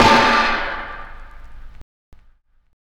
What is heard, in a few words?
Snare, Drum and Bass; EZ Rollers; Instrument Samples